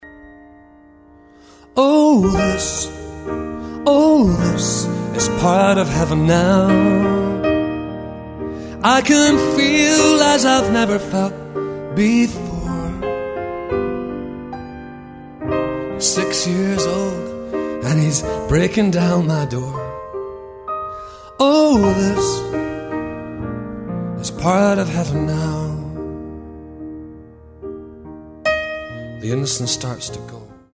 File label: Piano